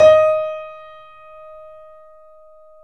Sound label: D#, Boesendorfer, 3D Pianos; E-MU Producer Series Vol. 5; 3-D Audio Collection